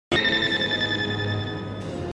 Alert; Gundam Wing

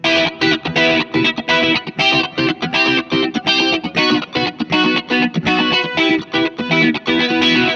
Simple Guitar

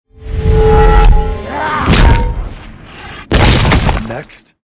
Hit; Thor Mjolnir

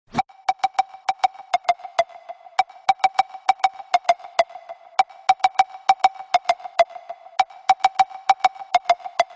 Axe Beats Msg Tone

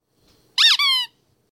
Squeaky Toy

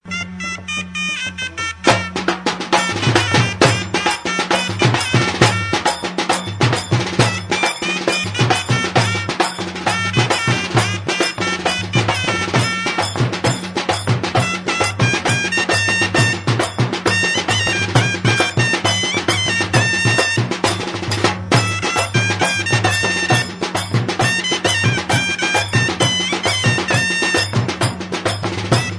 Kuthu Music

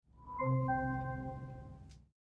door; Night flyers

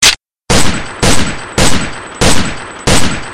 P18 Pistol

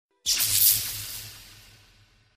Electro Shock

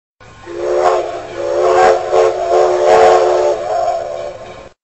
Locomotive Whistle

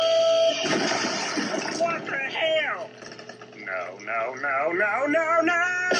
Bath Tub

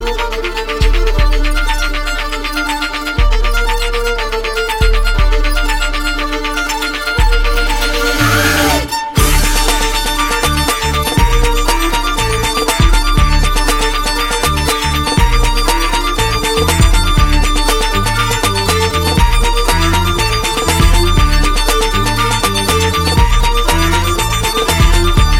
Thunder Beats

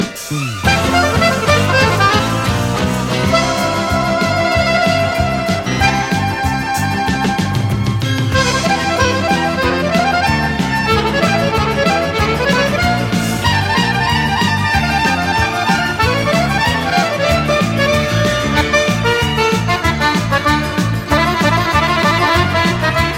Czardasz

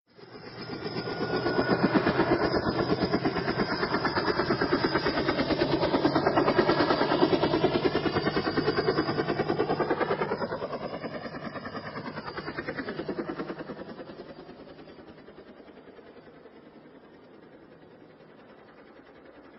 Helicopter

